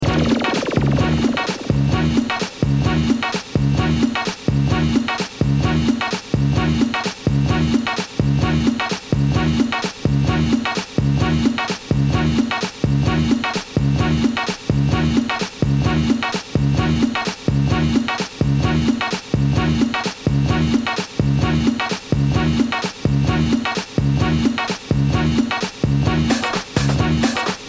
the funk

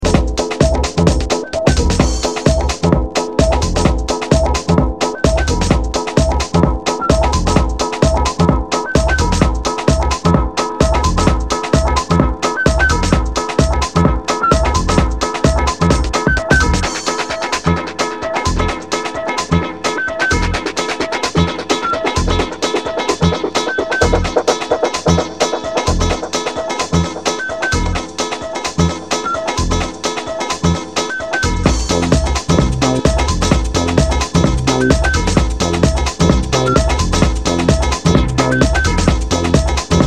Coração Tambor

